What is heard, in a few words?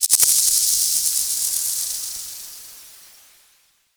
Tornado End; Warframe; Tengu